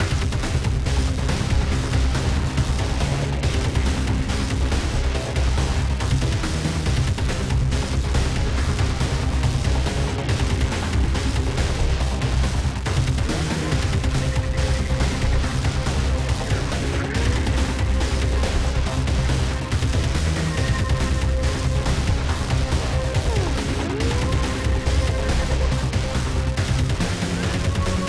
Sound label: Battle; Stage01